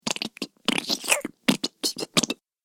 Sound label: Swamp - Gross Bubbling Ooze; Slimy Sound Effects